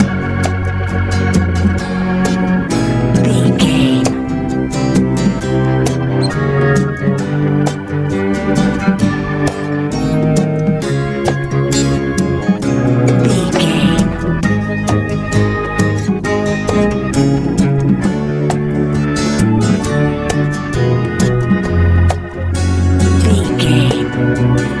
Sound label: easy pop